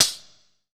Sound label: China, ChinesePrc; Akai MPC500